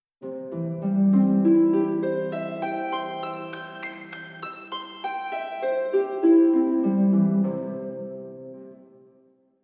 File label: arpa